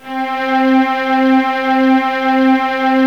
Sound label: Slow Strings; GMTones; Ensemble